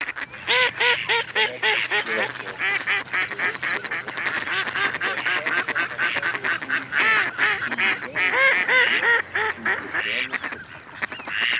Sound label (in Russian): Кряква микс Germano mix; plurifon